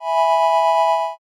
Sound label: strings